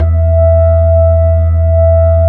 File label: B-3, Hollow; Keyboards of The 60's and 70's